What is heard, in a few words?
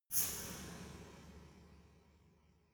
resonator hit single soft